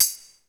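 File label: POWER KITS, tambourine; 90 sSampleCDs; Crypton - DrumBase5000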